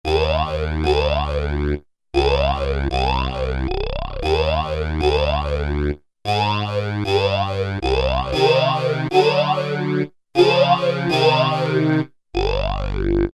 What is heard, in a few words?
Voc Why; Factory Presets